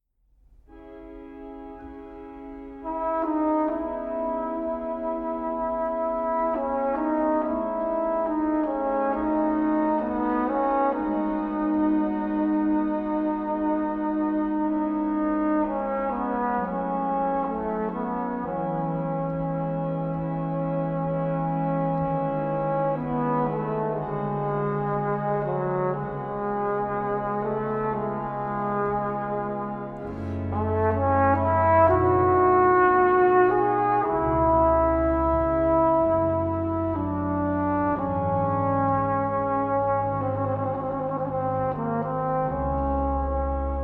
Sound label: Vocalise; S Rachmaninoff; Vocalise op 34 Nr 14